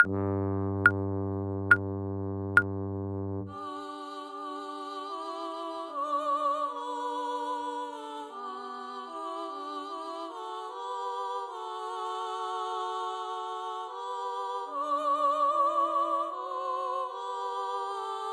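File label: Chorale Voice Sop Alto No Exercise; Bach Chorale 1; classical ear training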